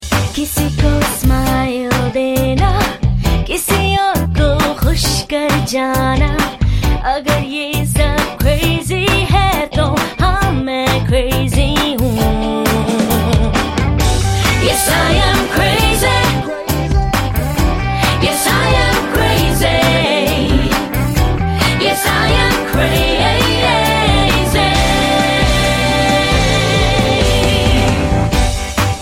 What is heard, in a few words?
crazy hoon female; Indian